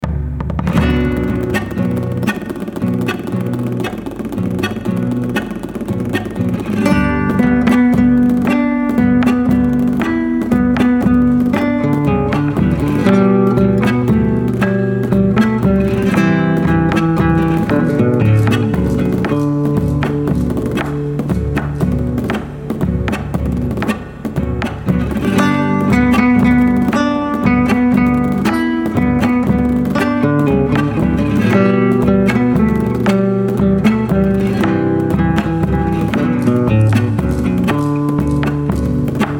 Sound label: Dance